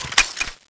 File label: smg change, weapons